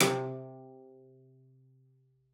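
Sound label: CELLO spiccato C5; 90 s Sample CDs; Xsample VOL-5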